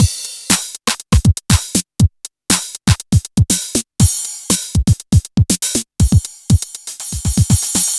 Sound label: TECHNO; Wizoo - Platinum 24 Electronic; DEMO KIT